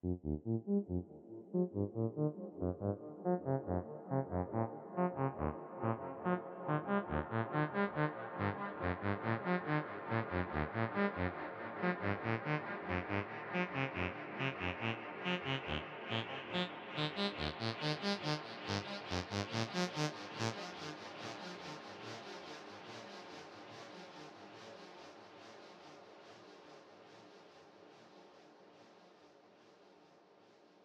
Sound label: D Min Pentatonic Lead Delay Reverb; 1 4 Delay; Loki